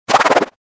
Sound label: move fluttered small